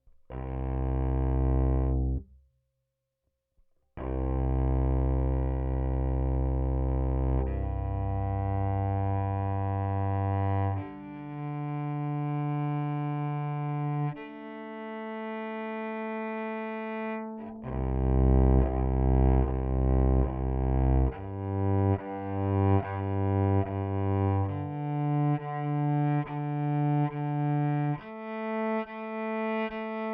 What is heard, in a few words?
pickup direct